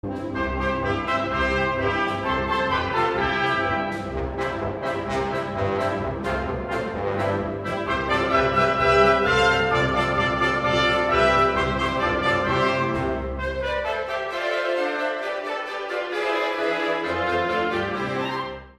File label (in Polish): dzwonek karolinka instrumentalnie